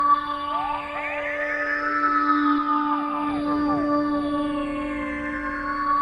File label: amb abstract high; Half Life Model Pack